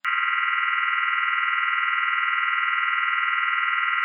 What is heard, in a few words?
gearbox vibration noise